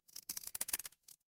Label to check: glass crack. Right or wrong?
Right